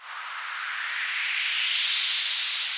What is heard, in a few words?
Uprise